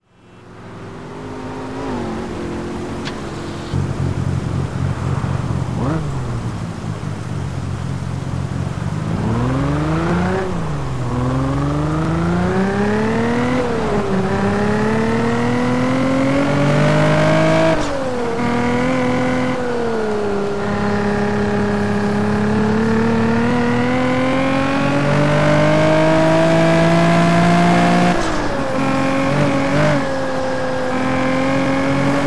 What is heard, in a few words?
toyota mark 2 jzx90; TDU-1